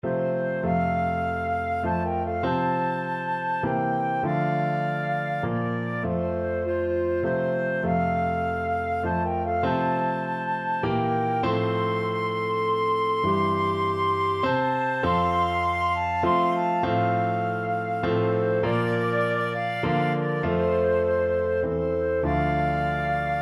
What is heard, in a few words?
amazing grace flute clarinet, flute clarinet duet